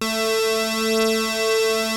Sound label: PPG A3, Rare Synthesizer; Hollywood Edge; Giorgio Moroder Rare Synthesizer Collection; PPG.2.3